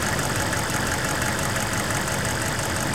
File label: Humvee Idle Loop; Trucks